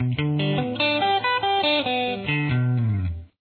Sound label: D style arpeggio, guitar